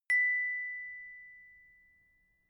notification glockenspiel single

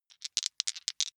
big two rattle in hand short

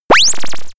sweep activation

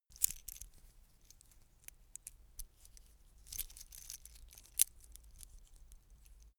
metal watchstrap rattle

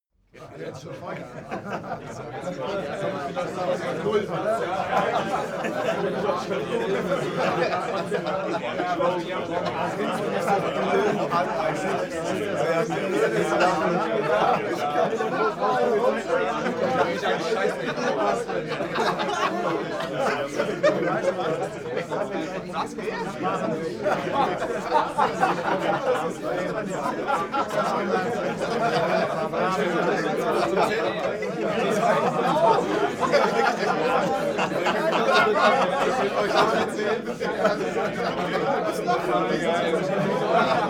male crowd happy

